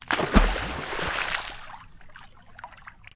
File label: Water; TOOLKIT vol.2